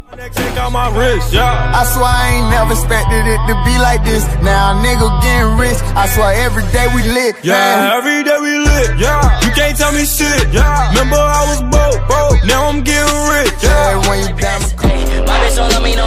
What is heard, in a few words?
new hip hop ringtone